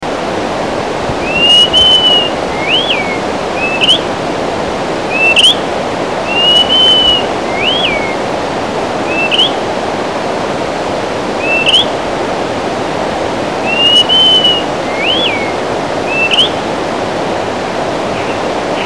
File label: Greater Pewee